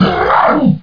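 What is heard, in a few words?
ninja pain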